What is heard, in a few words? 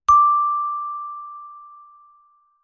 one bell